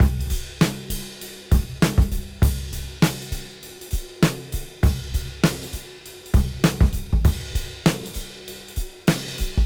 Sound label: Cym Beat 99 BPM